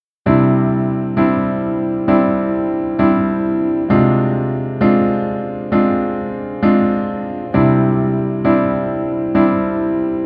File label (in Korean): Piano ver, 멜로디MR